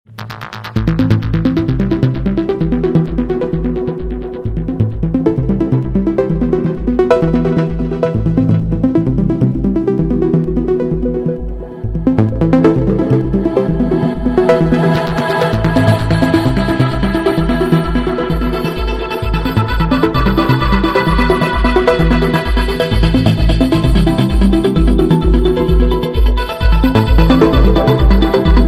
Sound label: melody ringtone; for note8; galaxy ringtones